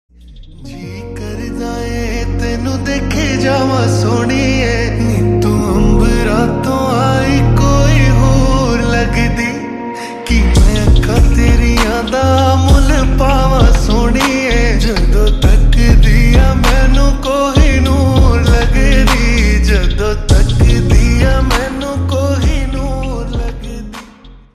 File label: Slowed Reverb Ringtone